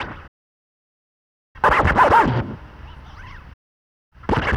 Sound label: Wiggle Disc, Urban Grooves